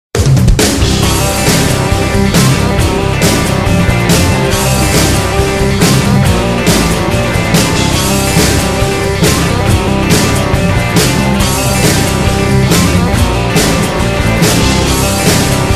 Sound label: Western theme with Electric Guitar